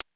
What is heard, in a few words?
HH Close, Vermona Mono15 Drums Hi-Hats